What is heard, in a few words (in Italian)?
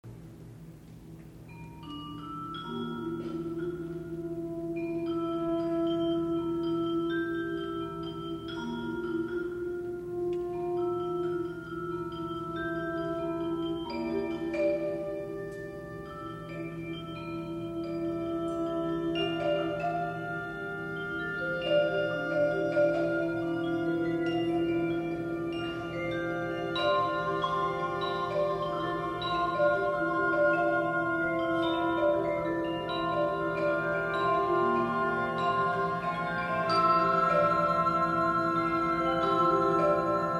recitativo